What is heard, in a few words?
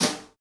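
New Brush Snare Flam; Snares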